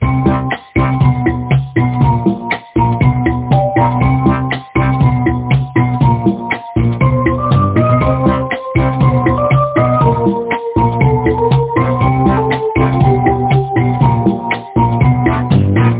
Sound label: 70s Action